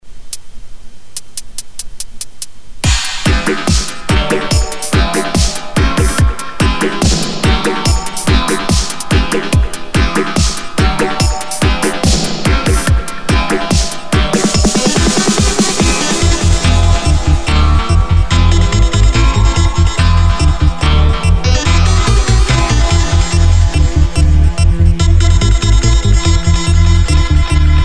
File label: safari dub